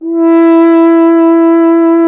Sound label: F. Horn; Miroslav Vitous; Solo Instruments VOL-2